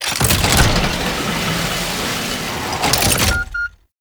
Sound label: Alchemy Transmuter Hit React; Warframe; Entrati